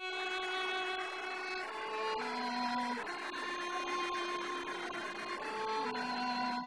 Castanets Violin Applause

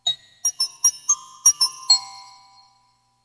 Glockenspiel

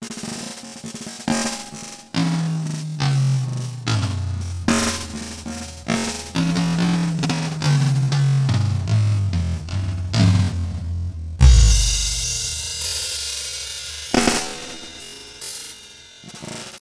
Drum Solo

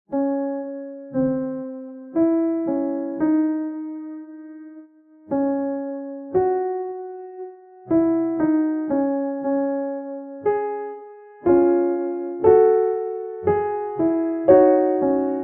Bach rightHand WienerFilter; BWV849-02

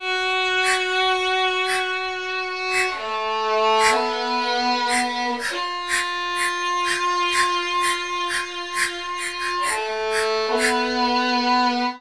Castanets Violin; TSMtoolbox

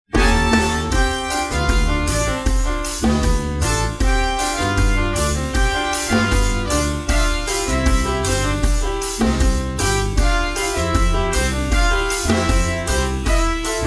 Jazz; TSMtoolbox

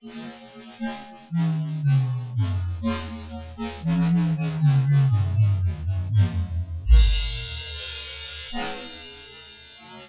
Drum Solo

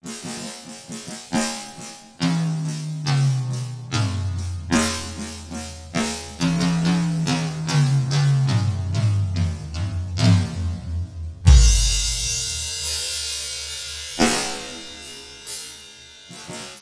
Drum Solo

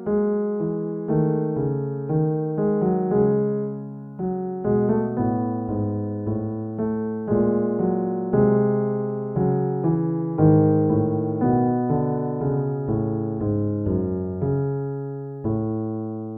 Bach leftHand Reference; BWV849-02

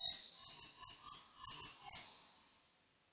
Glockenspiel

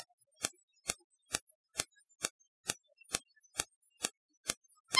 Vibrato Impulses Noise Perc

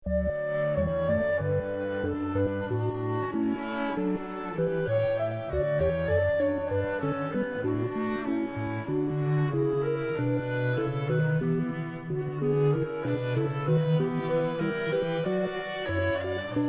Sync Sine Soni

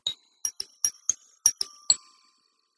Glockenspiel

